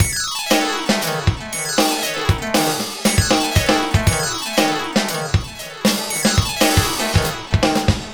Gliss 2fer Full, lounge, 118; Zero-G Kocktail Kollection; Construction Kitz (Lounge); Gliss 2Fers Mixes 118

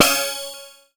C#, BELL GONG, Synclav FM; Big Fish Audio

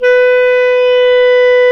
clarinet, Clarinets; Clar Jazz; Roland L-CDX-03 Disk 1

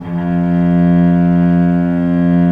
VL F1, VIOLE, Historical Instruments; USB Soundscan vol.23

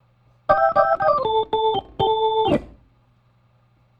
Organ, 70s Analog Funk; Sonic Foundry (Sony Creative Software); What it Is! 70s Analog Funk

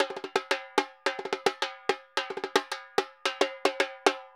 Repique Murga 110 bpm; Best Service; Festa Latina VOL-1

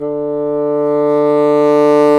Bassoons; Roland LCDP04 Orchestral Winds; 90 s Sample CDs

